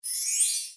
open card

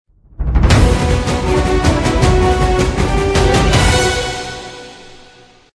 Bigwin music

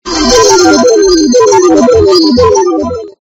Coin Light Move

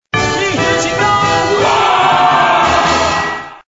sound water

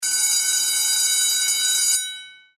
Freegame start

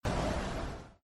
Wind out

